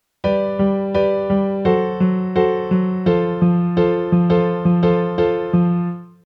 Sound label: passing down dim